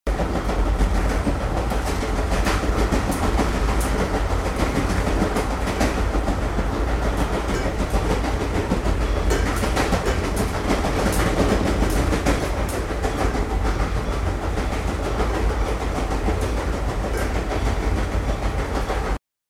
stuk koles vnutri passazhirskogo poezda

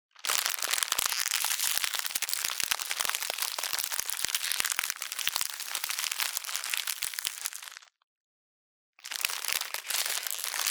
treshhit tonkij led pod nogoj

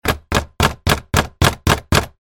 razhljabannaja dver; Звук стука в дверь

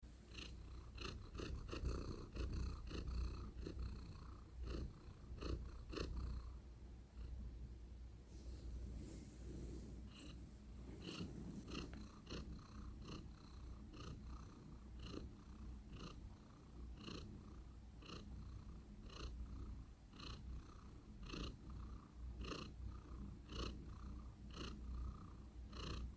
koshka obnjuhala mikrofon i prodolzhaet urchat

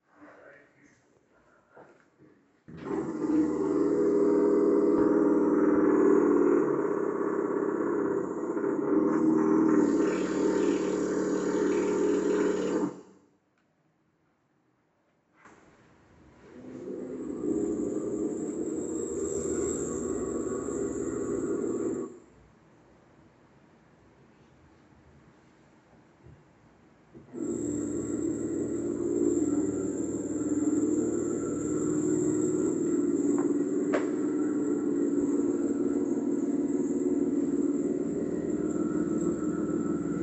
za stenoj chto to sverljat

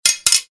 dva udara v srazhenii; Звук мечей; Звуки сражений на мечах, звук ударов меча

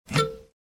korotkoe otkryvanie stekljannoj dvercy v vannoj

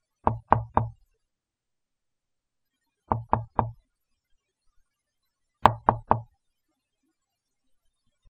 tri stuka zagadka; Звук стука в дверь для сказки; Для детей, для детских постановок

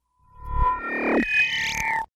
glitch navodka

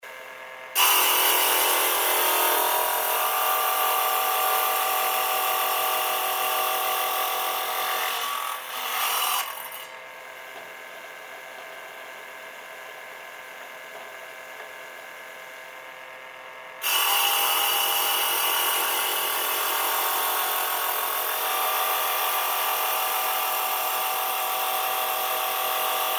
plitkorez

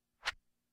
prolet volana

